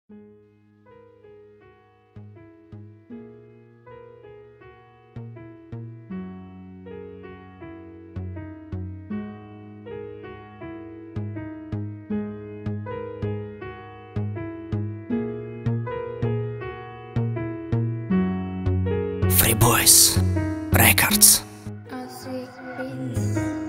rap

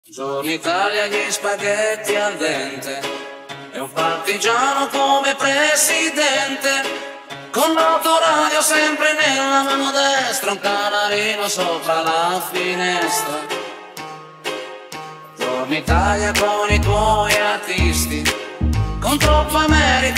remix

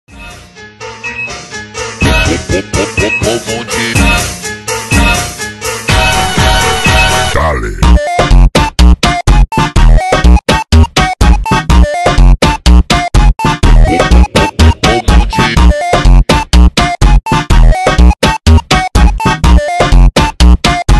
beeper funk slowed

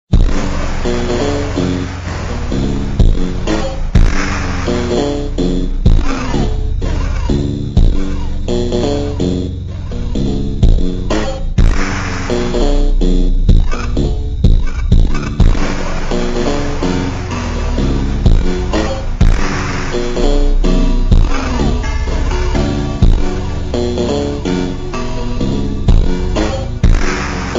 baixo ultra hyper mega slowed reverb phonk

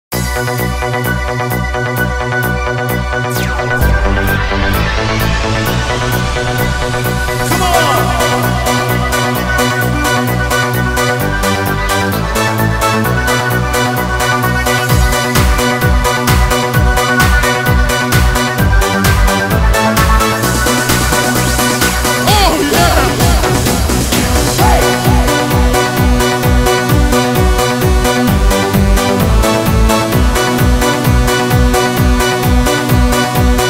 future beat melody mix

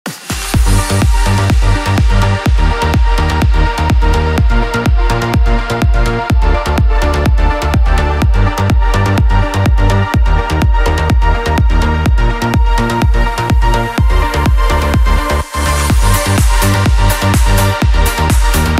progressive trance

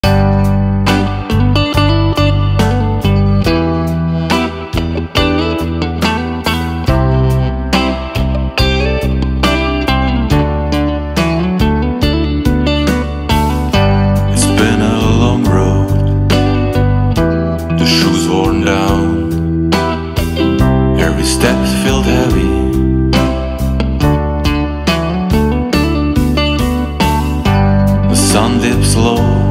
blues soul music fingerstyle

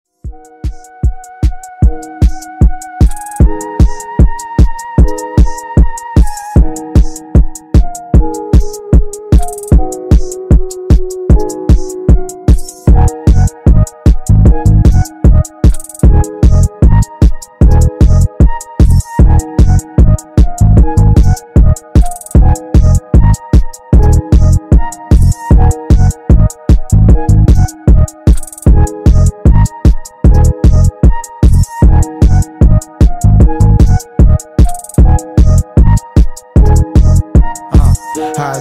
bass boosted

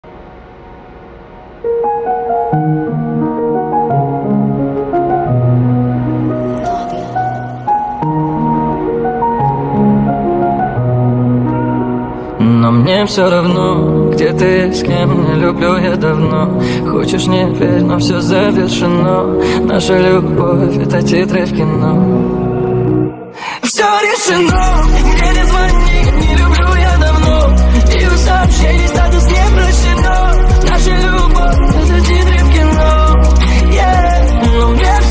speed up reverb

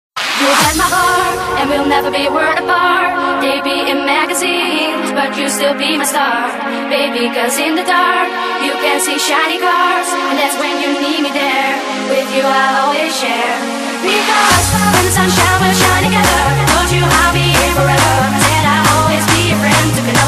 techno remix

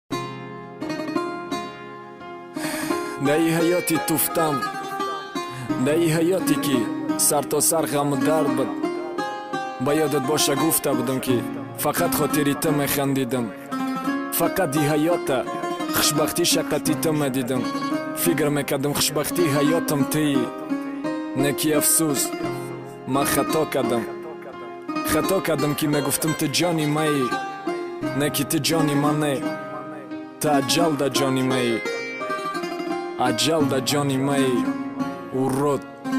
rap

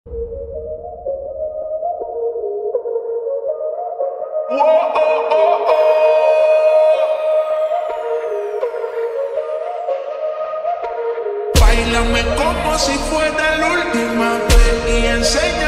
slowed reverb english song

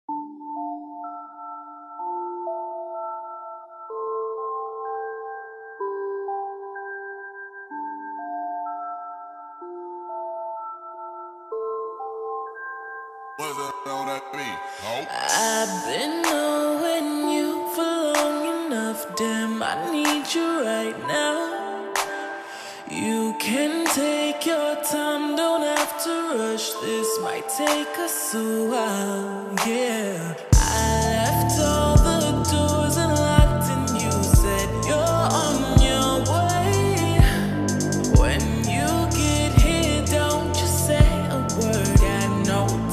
solo version slowed reverbed

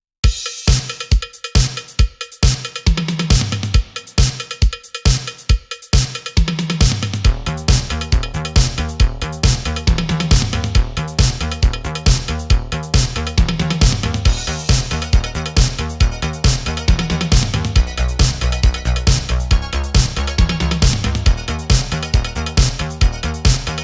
electro remix high energy

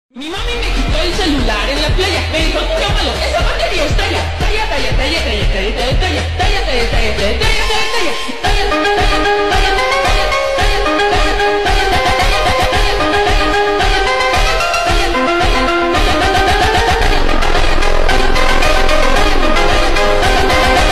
funk slowed

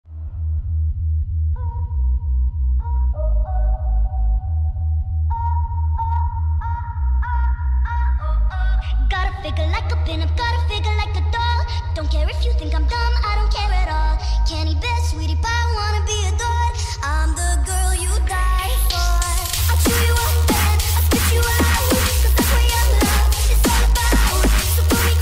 hardstyle remix

